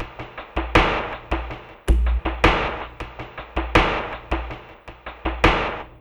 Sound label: Down-Home, ambient